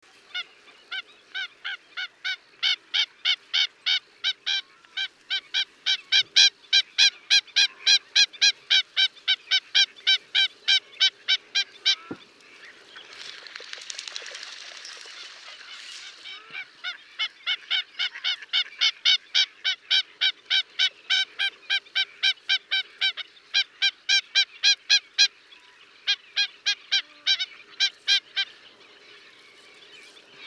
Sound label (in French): chasse blanche, l'echasse blanche